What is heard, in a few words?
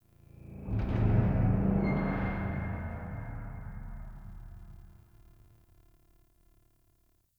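Metallic low frequency effects; Warframe; Ambience; Nineteen Ninety Nine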